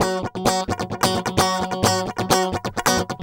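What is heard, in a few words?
guitar, 130 BPM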